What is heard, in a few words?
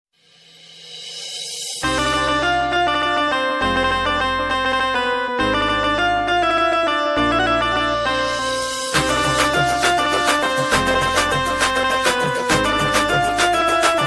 marathi bgm ringtone